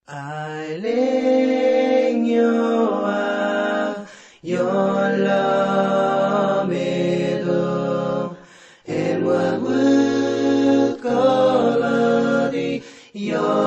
gimn marshallovih ostrovov

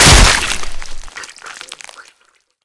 gornij neupravlyaemij kamnepad

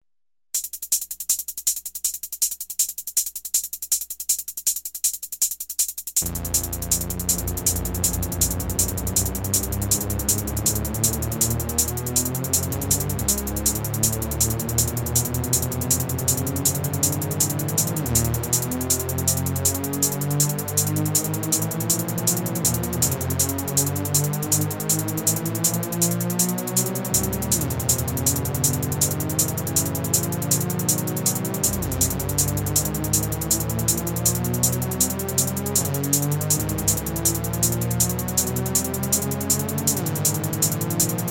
iskusstvennij zvuk gonok sintezator

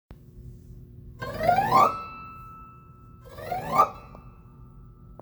sozdano kogda ya provozhu palcem po strunam fortepiano

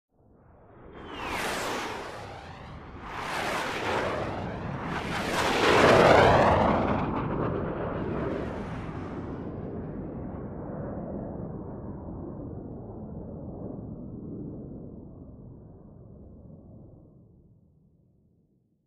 neskolko reaktivnih istrebitelej proletayut mimo

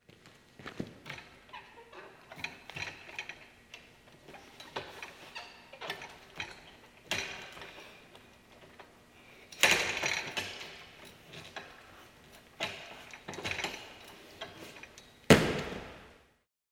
gimnastika uprazhnenie i ego okonchanie

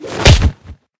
rezkij korotkij suhoj udar

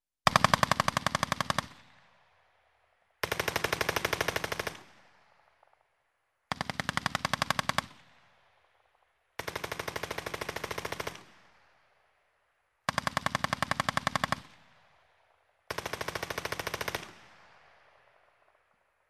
pulemyot ogon s dvuh storon

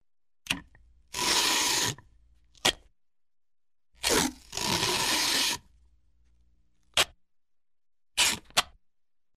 zvuki skotcha otmativanie i obrivanie lenti

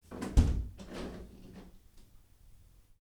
hlopkom zakrivayut okno

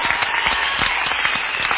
aplodismenti srednej gruppi lyudej s krikami

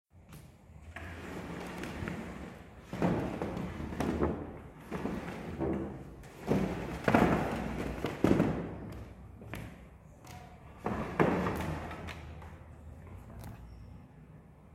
volochashaya lestnica

